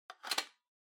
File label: Portable MD Charging Stand Put Down